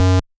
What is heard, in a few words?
bass